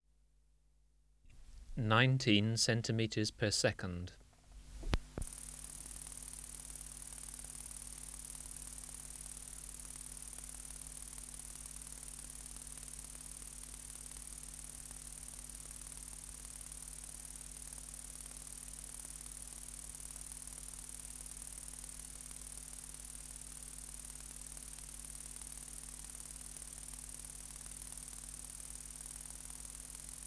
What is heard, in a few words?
Polysarcus denticauda